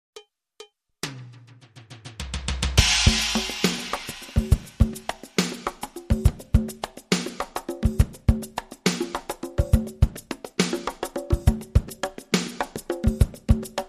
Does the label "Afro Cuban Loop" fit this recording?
Yes